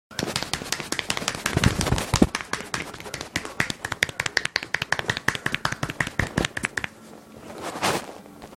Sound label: wtf boom